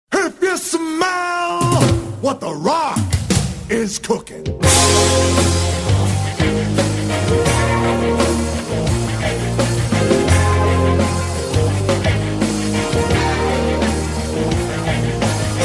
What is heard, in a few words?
Rock